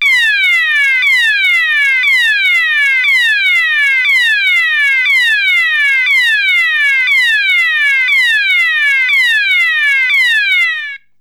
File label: Sounder; High/Low Fast Whoop - 1200Hz to 500Hz; Weather Proof Sonos Electronic Sounder SS240W